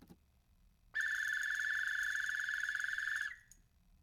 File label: whistle